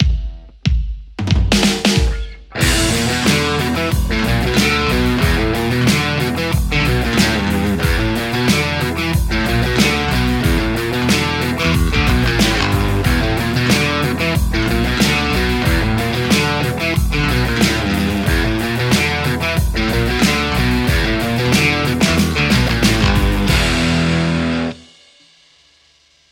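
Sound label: Telecaster JCM800; mercuriall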